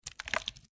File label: Bones; Blind Quest - The Frost Demon